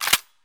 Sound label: weap bolt out; Escape from Tarkov